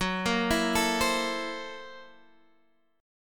Gb 7th Sharp 9th Flat 5th Gb, Guitar Open D; chordshape; down

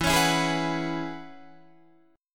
E 6th Add 9th E down Guitar Open D

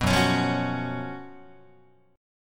F sharp Major 7th Suspended 4th, Guitar; F#M7sus4 Chord; strummed

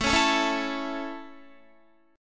A Major, Guitar; strummed

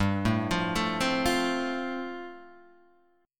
G 7th Suspended 2nd Suspended 4th; G7sus2sus4 arpeggiated

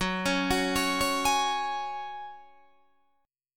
Gb Suspended 2nd, Guitar DADGAD

